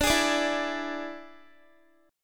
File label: D Suspended 2nd Flat 5th Guitar; strummed